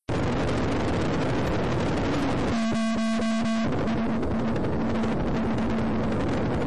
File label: Meteorscatter ping low speed